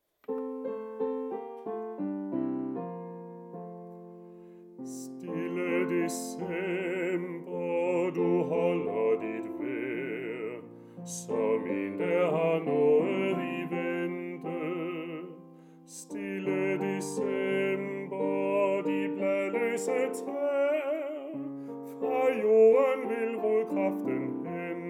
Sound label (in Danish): stille december bas